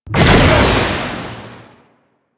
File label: Jet Turbine Pistol Secondary Shot; Warframe; Tenno